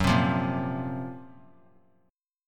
Gb Major 7th Flat 5th; GbM7b5 strummed; JGuitar

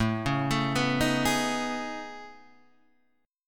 A Major Sharp 11th; AM#11; arpeggiated; JGuitar

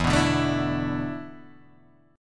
A Suspended 2nd Suspended 4th E

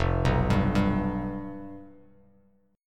F Suspended 2nd Flat 5th, Bass Standard; arpeggiated; 1,2,5,0; JGuitar

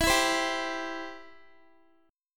E Minor Sharp 5th, Guitar, 4F,4A,5C,5G,5C,6E; Em#5; strummed

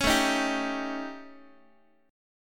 A 7th Flat 5th Guitar; A7b5; strummed

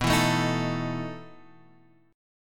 E Major 7th Suspended 4th, B

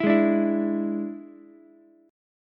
D Suspended 2nd Flat 5th down Ukulele; strummed

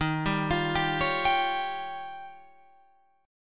Eb 9th, Guitar, Open F; arpeggiated

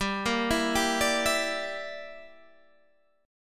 G Minor 6th, Guitar Standard; Gm6 arpeggiated; JGuitar

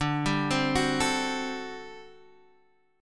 G Major 7th Suspended 2nd Suspended 4th D

